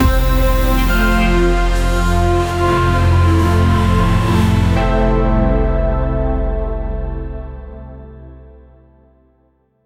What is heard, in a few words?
an exciting cinematic int, build up